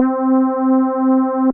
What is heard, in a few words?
Piano, Synth Keys; Instruments; Carnival 200 Piano; Ableton; Synth Essentials; Operator